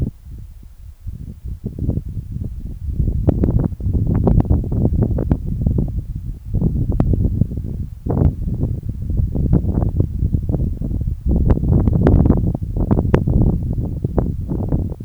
Wind at coast

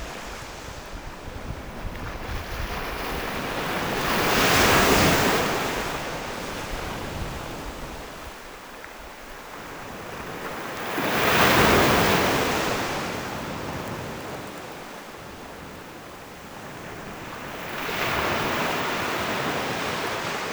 Waves on sand beach

